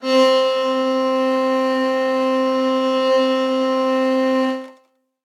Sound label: Handmade Zither; Strung Together